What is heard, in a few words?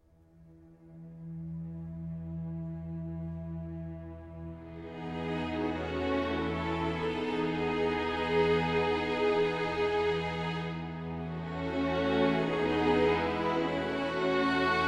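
E play reference Cello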